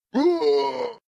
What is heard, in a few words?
enemy die; ninjarun